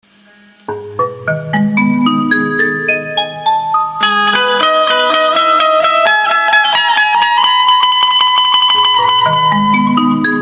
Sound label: bulbul tarang